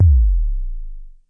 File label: low bass long, Roland SH09, drum machines